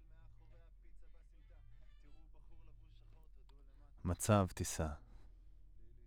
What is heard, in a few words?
Voc OD